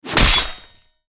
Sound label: greatsword flesh; weapons